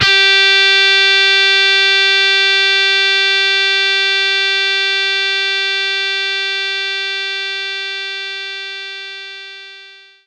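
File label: Distortion Guitar G3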